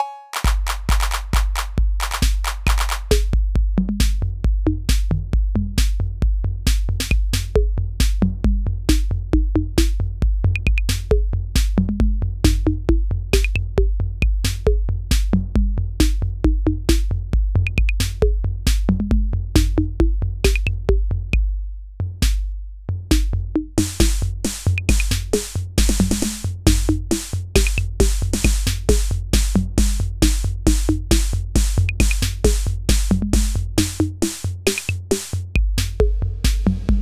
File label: 808 jam